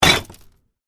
Plastic container full of bottles and cans: short hit; Impact; Miscellaneous Impact Sound Effects; Stuart Duffield